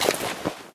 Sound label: hunting rifle helping hand retract; Left 4 Dead; Source Engine